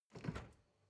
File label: refrigerator door open